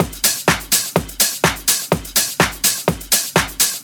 flashy techno top loop; drum loops

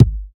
perfect kick drum sample g key; kick drum samples

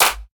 clean hand clap sound b key

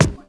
smooth bass drum single shot c sharp key; Duration 0:01; Samplerate 44100 Hz; Channels Mono

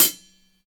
Focused Pedal Hi-Hat Sample C Key; hat samples

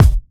dry bass drum sound f key; kick drum samples

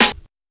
old school piercing snare C sharp key; snare drum samples

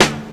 00s loud snare drum sample f key; Duration 0:01; Samplerate 44100 Hz; snare drum samples

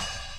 raw open hat sample c sharp key

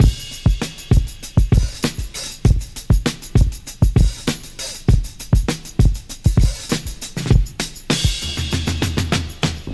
99 bpm breakbeat sample G sharp key; drum loops

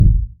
boom kickdrum sound f sharp key; kick drum samples

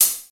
clear closed hi hat sample f sharp key; hat samples

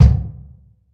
fat kick drum one shot c sharp key

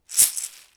acoustic wood shaker one shot; percussion

